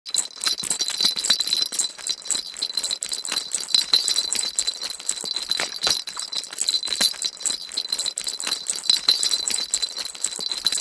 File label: Rattling a toy bell randomly